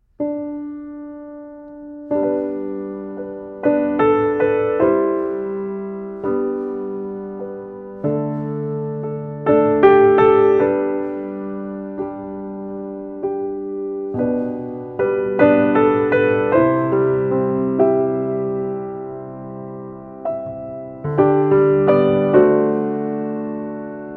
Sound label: The Bear Went over the Mountain (Instrumental Version)